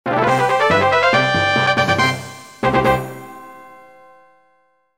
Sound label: Jingle Levelup; Monokage Quest; 3DS